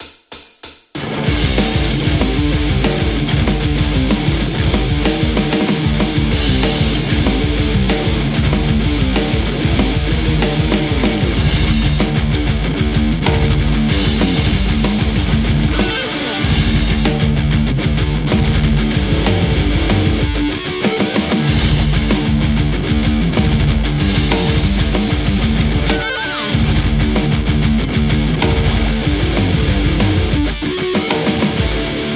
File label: metal Les Paul; overloud th2 guitarheromod